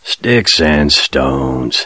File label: taunt; jc denton